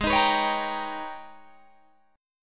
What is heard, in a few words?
G sharp Minor Major 11th, Guitar Drop D